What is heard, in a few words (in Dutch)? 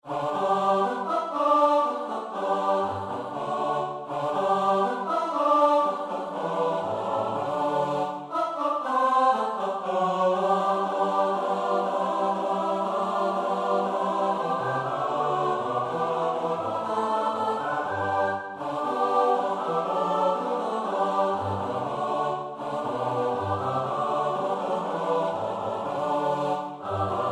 S-A-T-B, koorpartij oefening; Brahms (Johannes); Sechs Lieder und Romanzen, op. 93a